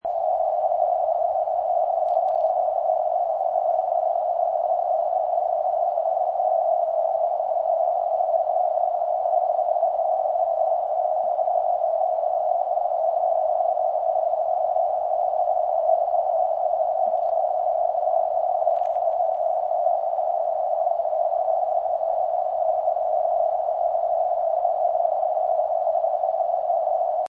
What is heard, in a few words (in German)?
DCF77 Ausfall; DFC77-Auswertung